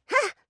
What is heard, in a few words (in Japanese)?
攻撃